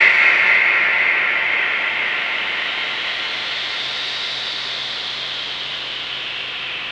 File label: NOISE, Production Effects